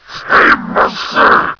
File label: zombie attack; zombie barney